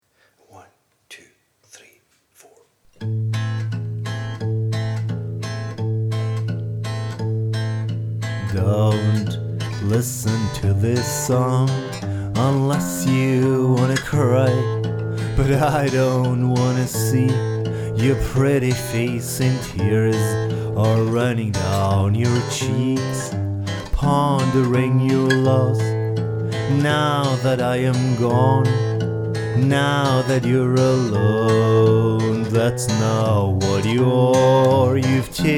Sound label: vox git